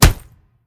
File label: hit minion; smite; gilgamesh